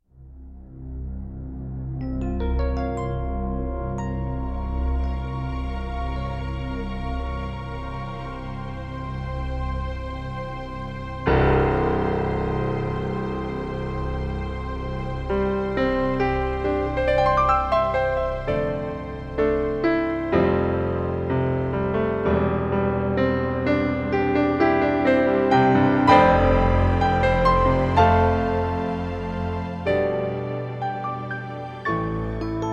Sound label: kurzweil micropiano